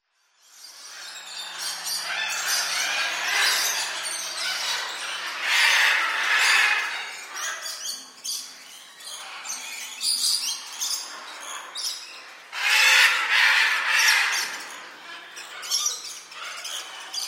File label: Indoor Jungle; SOUND EFFECTS1